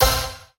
scatter short; spinsane

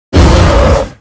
gorilla; silverback

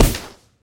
substitution Symbol Burst; shangrila

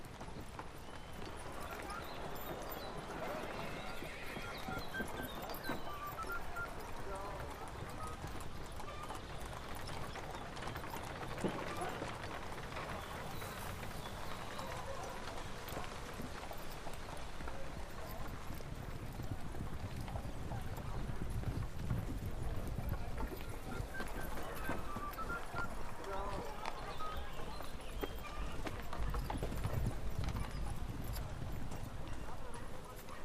ambience General; excalibur

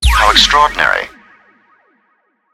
Big Win Mega Win; knight rider

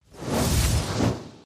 energy Swoosh; elements

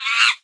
monkey; rage of the seas

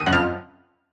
scatter Hit; excalibur

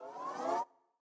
panel Open; frenchroulette3

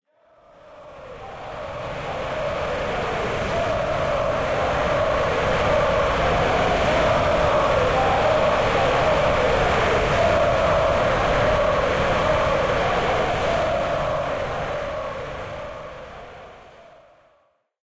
crowd Chant Spain, football

